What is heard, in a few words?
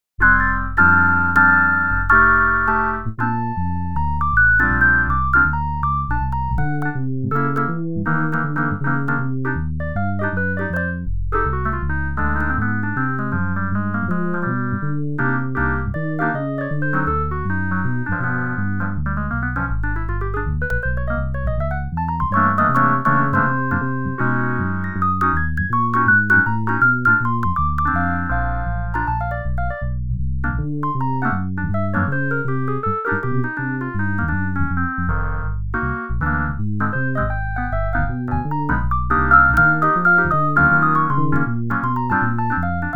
fretless bass; agl vst customs